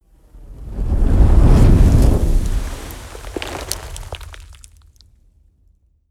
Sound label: whoosh fire ball crackle large